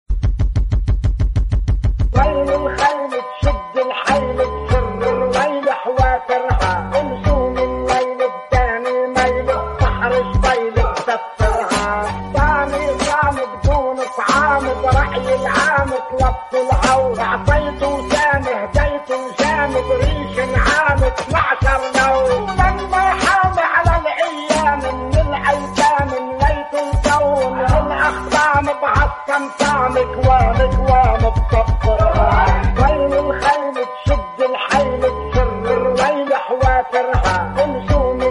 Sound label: HIP HOP ZAJAL LEBANESE RINGTONE